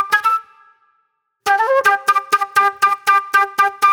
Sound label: Jammy Flute; Sonic Foundry (Sony Creative Software); Manhattan Flutes; Super Brown